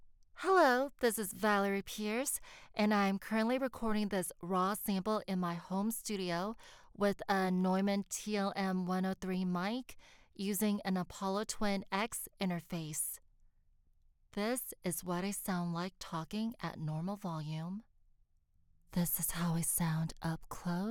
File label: Home Studio Sample RAW